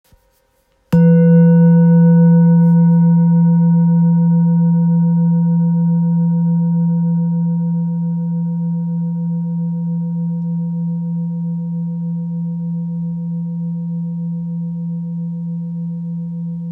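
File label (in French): Bol thick fa, bols chantants tibétains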